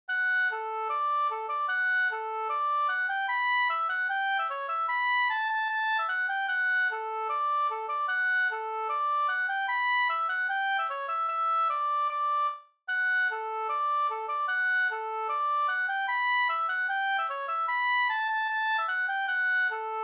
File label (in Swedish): schottis 4-4 Ddur